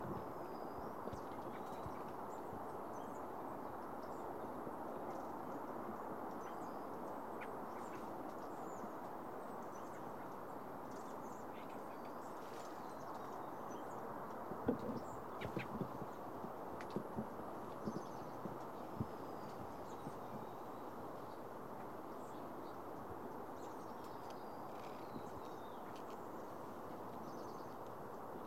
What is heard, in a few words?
bird